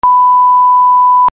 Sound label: bleep loud